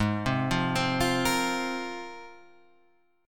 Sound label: Ab 6th Add 9th Ab, Guitar, Half Step Down